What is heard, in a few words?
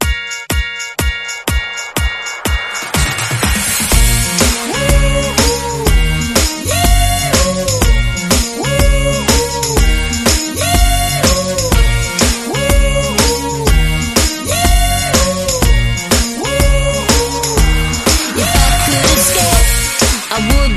123bpm Db